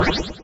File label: power